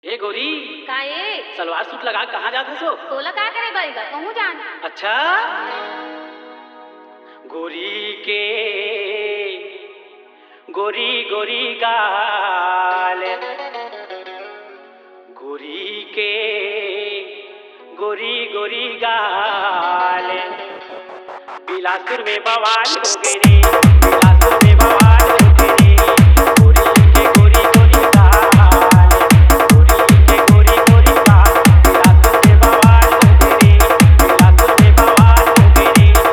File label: Chhattisgarhi Dj Song Remix Dance Mix